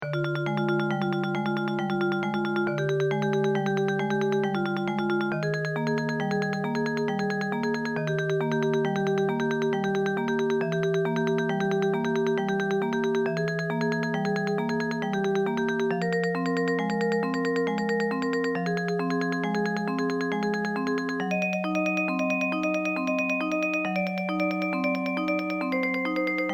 tremolo pieces sunset

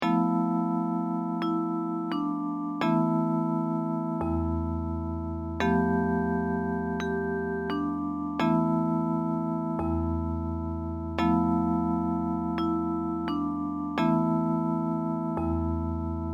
short melodies new year melody

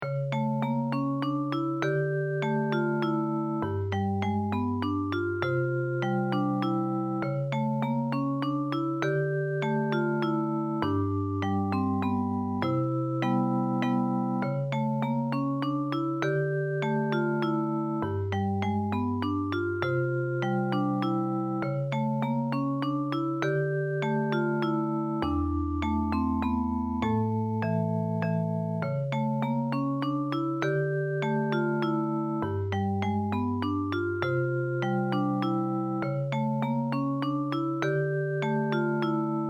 elegant waltz

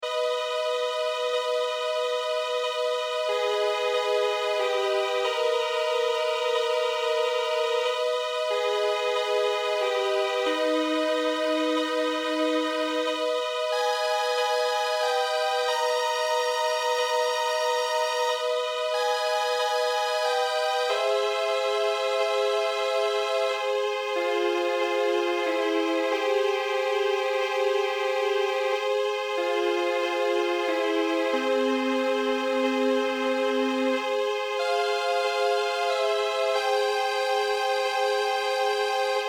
campanas de la tarde string quartet

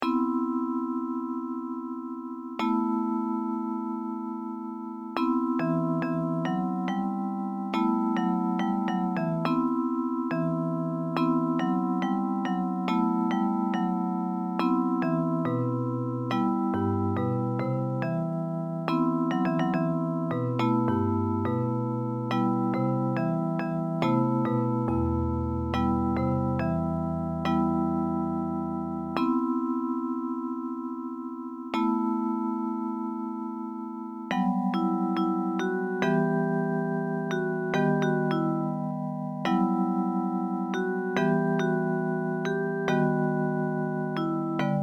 folk song of kyoto; nenne shinasare

